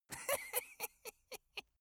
dwarf laugh sneaky

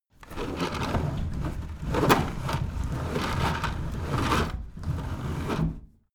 window roller shutter close medium

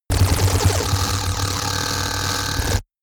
space charged laser blaster unsteady hard

